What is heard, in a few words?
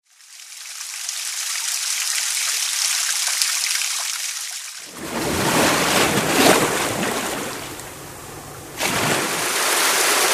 Relaxing Sounds of Water